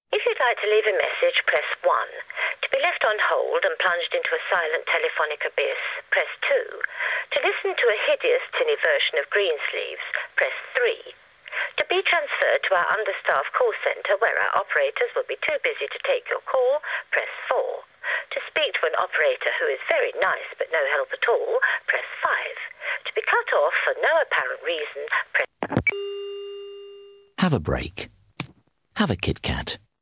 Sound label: PHONE